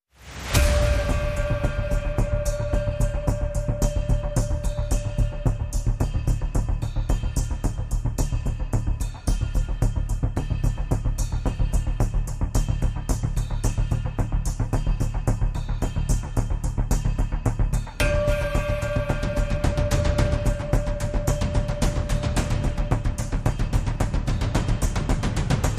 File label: No Cello Phrases